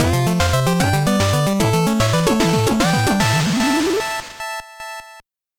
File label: stage clear; aqua chronicle